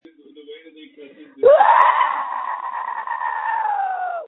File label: screams